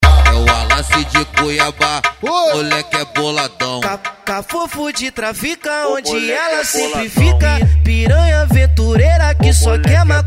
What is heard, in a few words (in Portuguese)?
funk ritmado; especial cuiaba